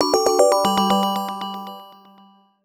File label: ringtones; Bergamot; HTC One M9